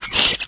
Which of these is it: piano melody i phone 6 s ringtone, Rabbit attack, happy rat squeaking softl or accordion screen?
Rabbit attack